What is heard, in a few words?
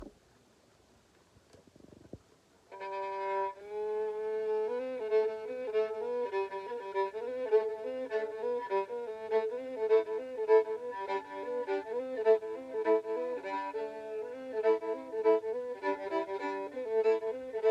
growling, fiddle